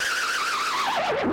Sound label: spin